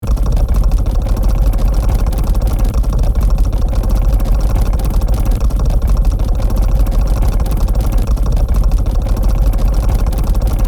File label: Stable rotating vintage mechanism loop; gfxsounds